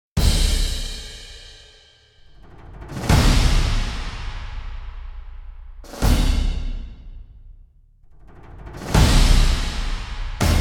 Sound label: drums